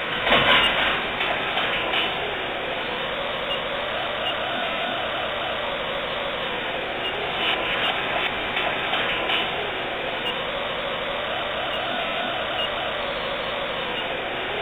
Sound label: noise loop; corruptedwoody4; slender